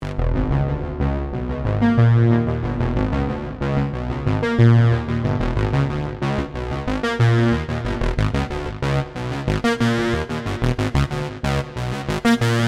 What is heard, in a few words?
supersaw stack; bluewave by music sound software